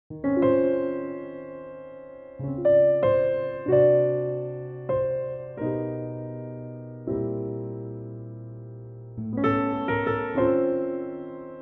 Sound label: G piano trak